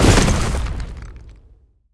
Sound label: drop